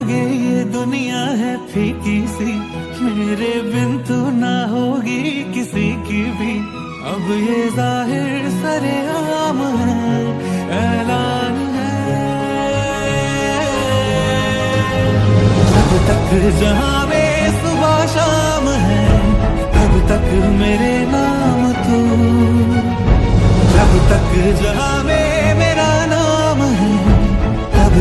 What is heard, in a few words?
bollywood, ringtone